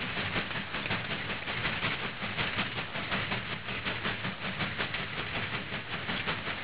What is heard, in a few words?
toy train loop